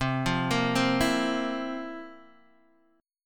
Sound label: F Major 7th Suspended 4th C